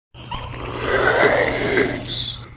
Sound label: zombie brains sfx; zombie pack sfx